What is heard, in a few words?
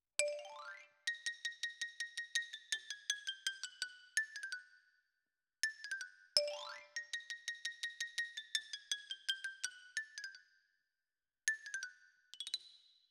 Xylo Close